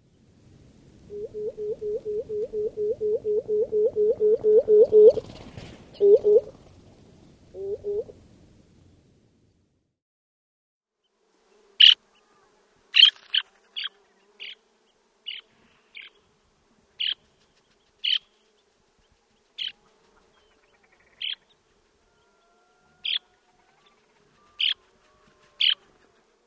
Pectoral Sandpiper

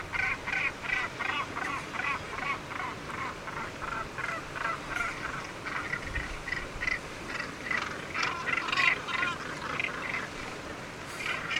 Northern Gannet Morus bassanus

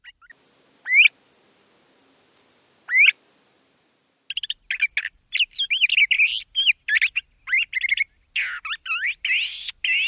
LeContes Thrasher

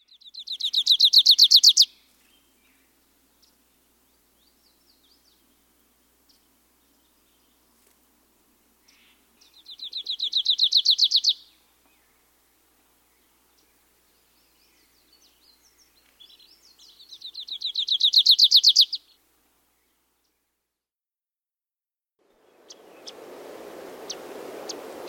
Graces Warbler

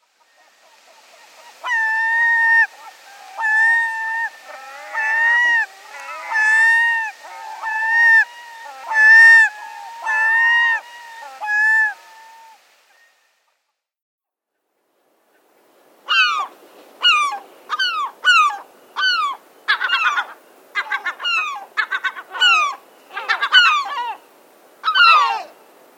Herring Gull

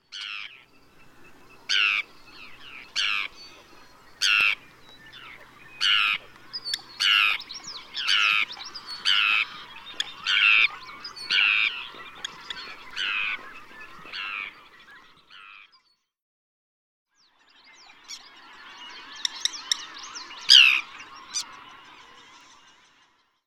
Forsters Tern

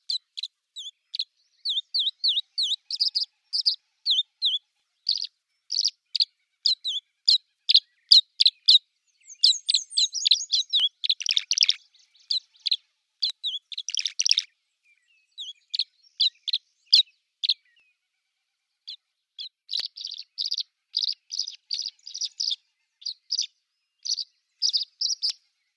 Tree Swallow

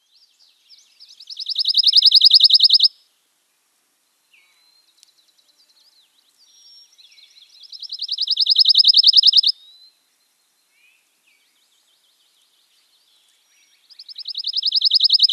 Pine Warbler

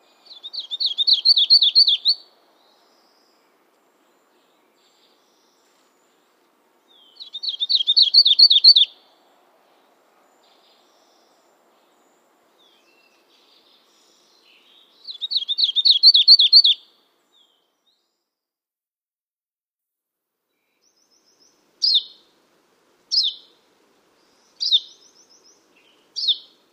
Painted Redstart

